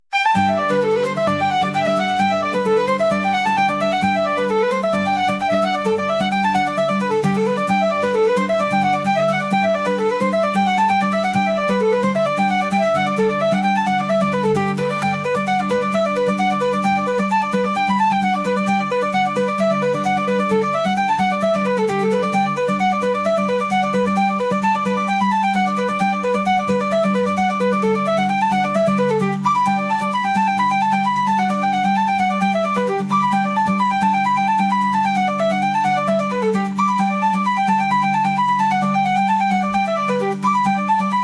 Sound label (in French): Instru fête; la fete au village